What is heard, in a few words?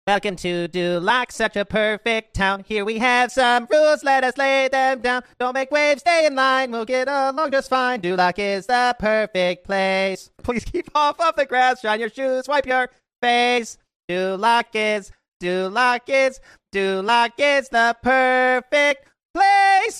corgi sings